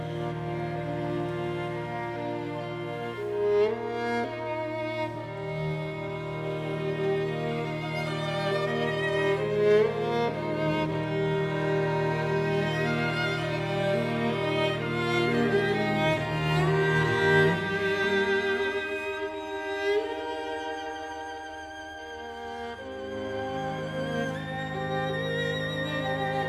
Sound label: String Quartet